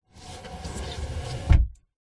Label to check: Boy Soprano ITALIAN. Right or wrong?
Wrong